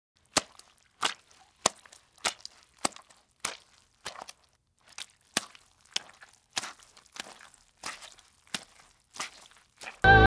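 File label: Footsteps, Walking Through Water Puddles; Fairlight Sound Lib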